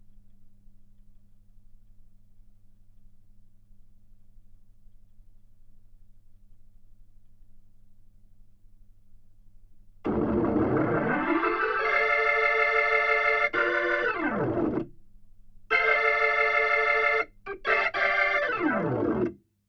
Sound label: HAMMOND